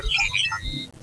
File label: Computer Beep Series; Star Trek; TNG